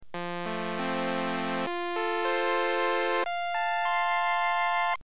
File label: Lower Viola, Gibson organ